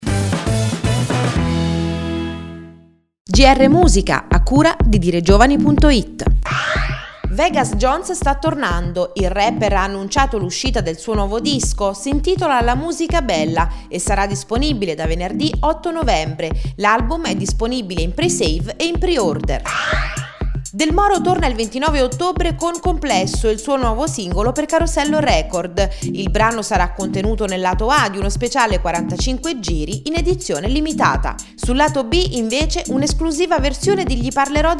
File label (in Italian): musica